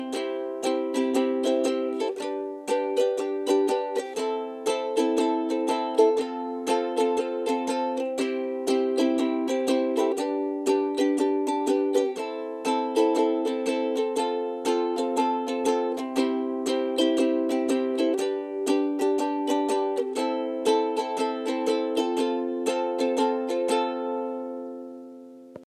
beach hawaii; ringtones

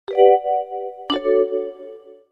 simple cool tone; ringtones; sms

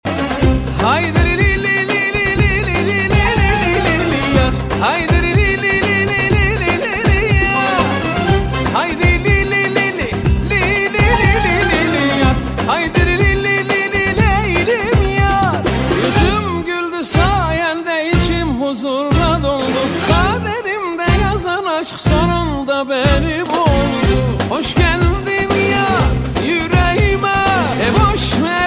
hadi hadi turkish

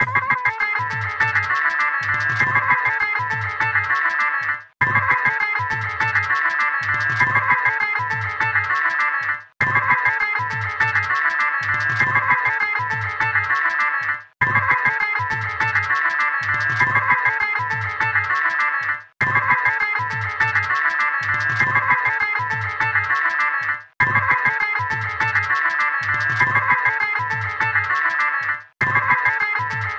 Bollywood

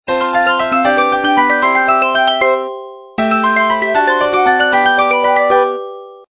cute tone; ringtones

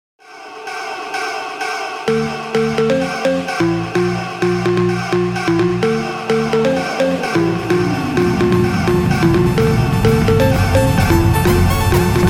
organs groove, electronic ringtones

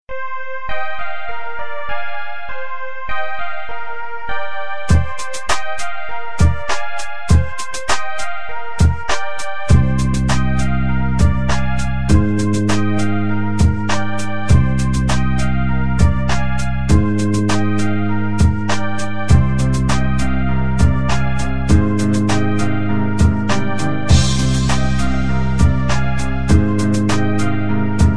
super rap beat; hip hop

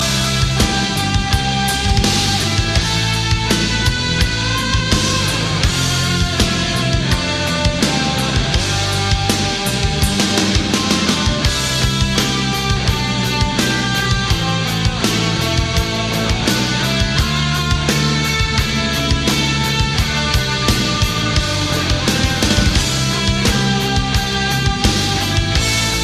guitar arpeggio

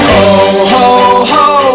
ohohoh

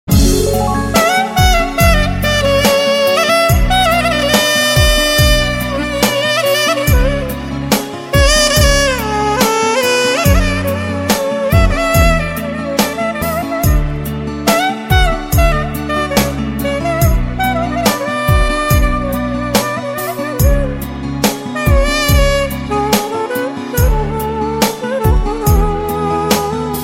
romanian saxophone

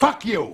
Is Calling Ska, ringtones

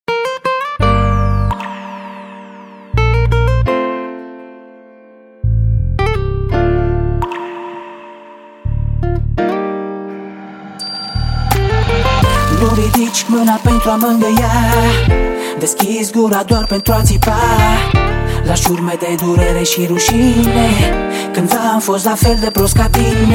Melancolic

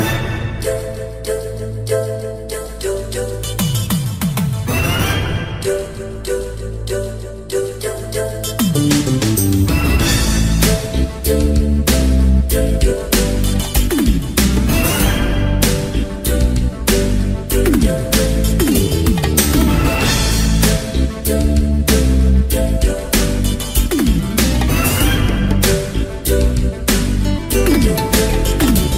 Konami Jingle

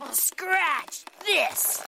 Scratch

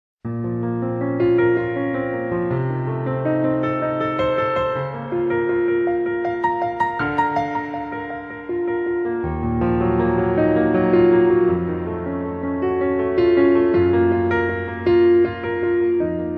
Piano; Nier; e3

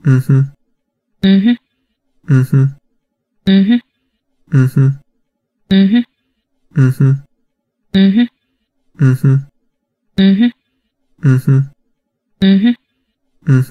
Mhmm Mhmm

